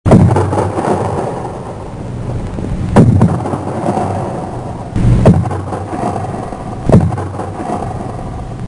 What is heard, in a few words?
artillery